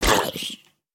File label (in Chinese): 僵尸村民受伤声